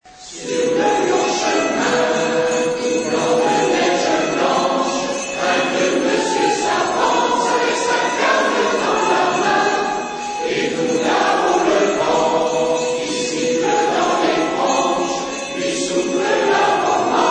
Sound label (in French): vive le vent jingle bells